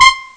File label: Licks, Amiga Soundtracker; ST-XX Amiga Soundtracker Sample Packs